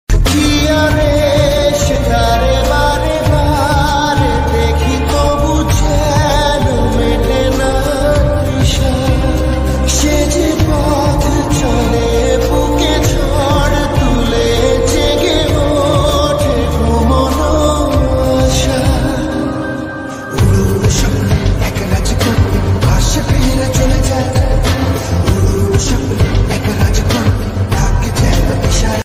Bengali Ringtone

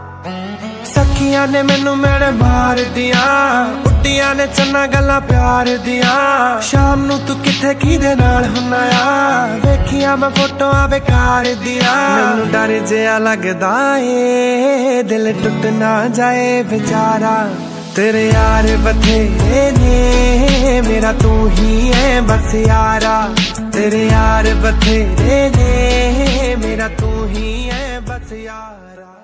Punjabi Ringtone Song

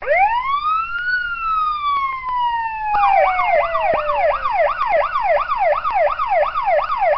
Police Music Ringtone; ringtones

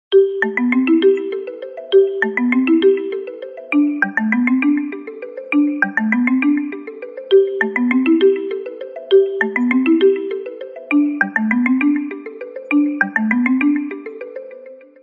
OnePlus 6T Original Ringtone

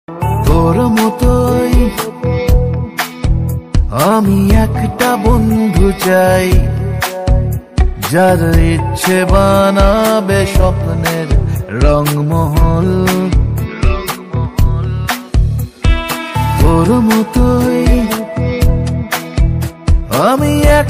Bengali Romantic Song Ringtone

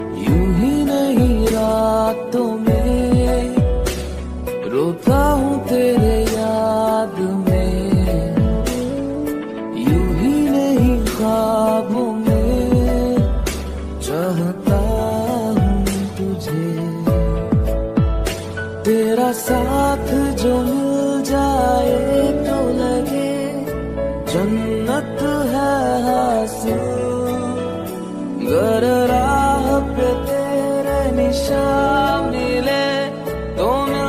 Love Song Ringtone

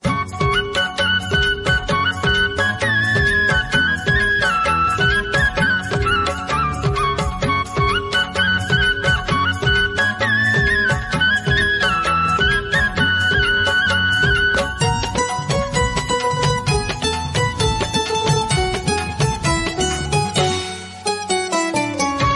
Music Ringtone

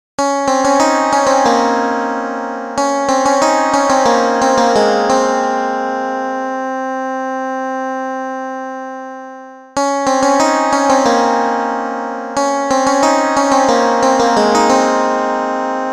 Instrumental Tone

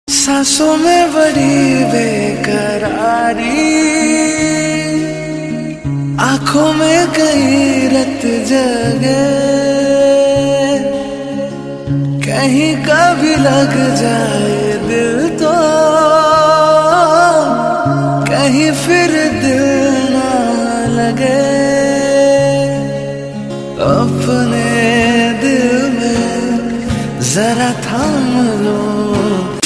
Romantic Hindi Song Ringtone

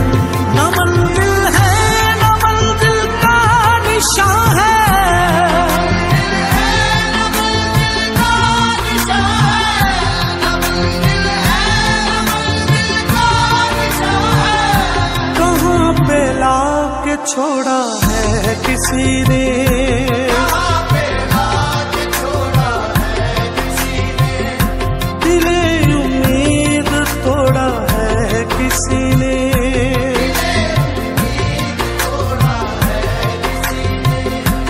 Sad Ringtone

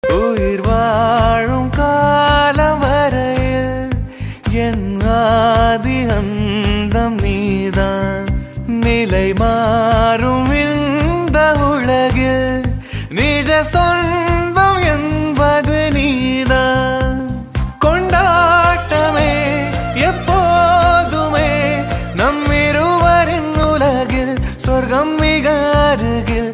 Tamil; Draupadi Movie

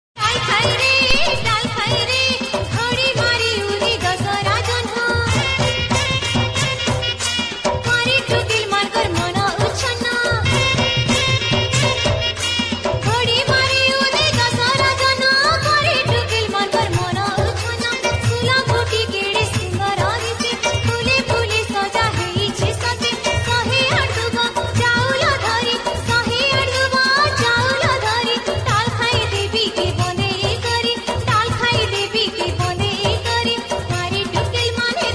Sambalpuri Song Ringtone

